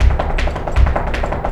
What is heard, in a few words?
Flamenco, ZAPATEADOSOL